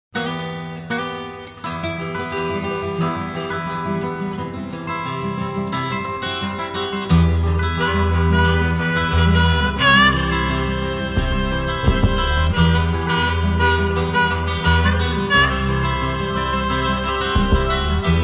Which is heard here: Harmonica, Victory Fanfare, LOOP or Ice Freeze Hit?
Harmonica